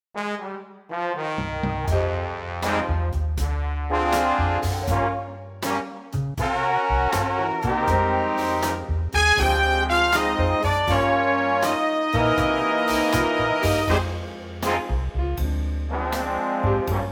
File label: Fm Big Band; key - Fm (Ab); vocal range - (optional C low note) F to Eb; Big Band arrangement